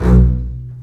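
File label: basses B1 marcato short; East West - The Ultimate String Collection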